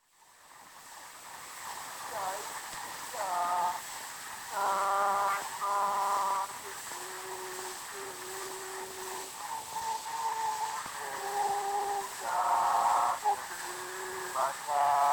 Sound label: Zulu recordings; Father Franz Mayr